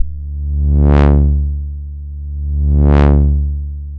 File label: Osc Plus Filter Test; The resulting sound resembles a tuba.; Wolfram; AnalogModeling; modelica